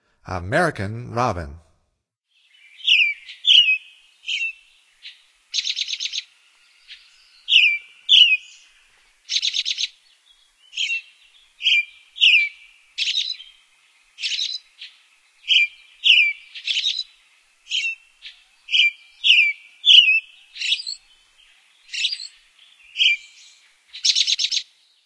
American Robin, Songs of Birds of North America; Stokes

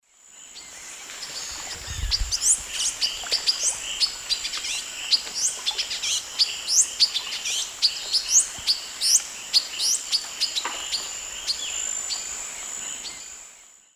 Ramphocelus carbo, fast song; S. America; Eastern Lowlands

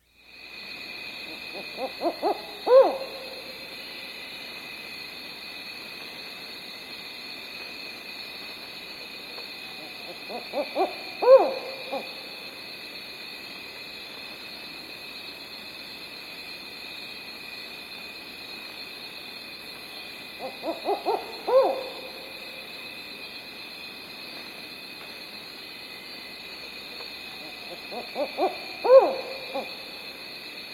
Black-And-White Owl; S. America

